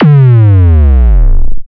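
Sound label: Kick Slowdown Distortion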